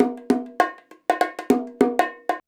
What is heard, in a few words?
BONG, BONGO 100, Modern Percussion Loops